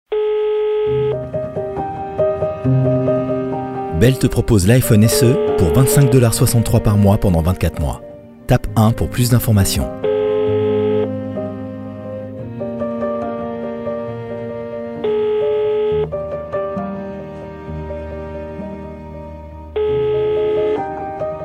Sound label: bell iphone SE